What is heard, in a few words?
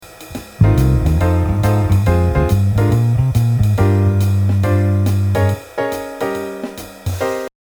E♭, bass